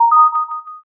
m notify

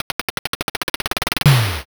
drumroll

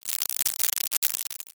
applause

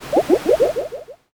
poison

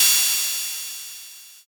cymbal

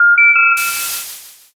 modem

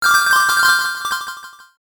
coin shower

